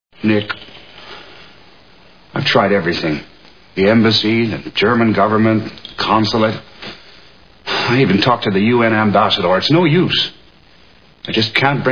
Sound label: orgasm